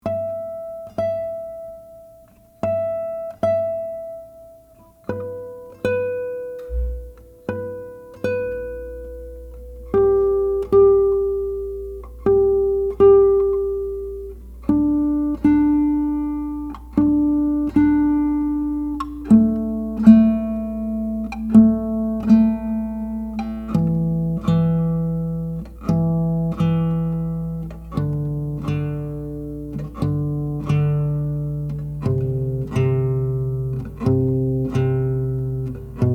Intonation; Intonation Test (Harmonic + fretted note @ the 12th fret)

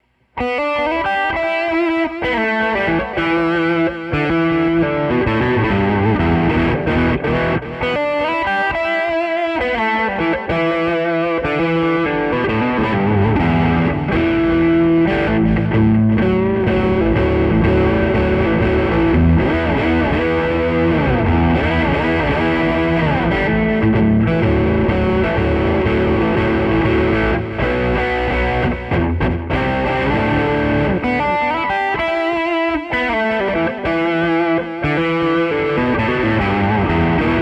Boogie

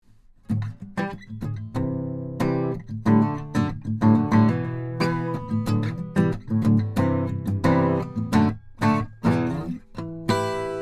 Harmonic

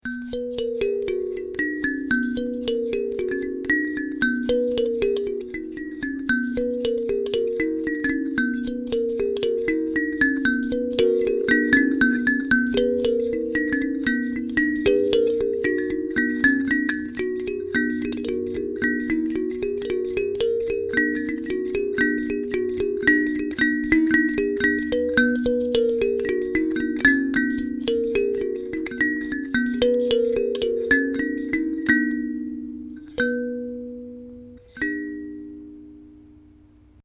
Kalimba; Neumann KM84

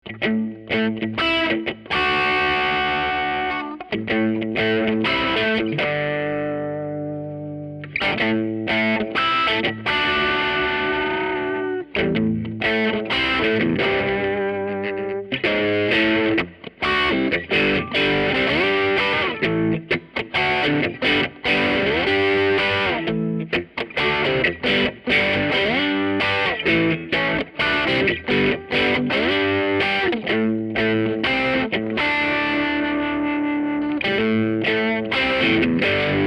Funk; PRS 513

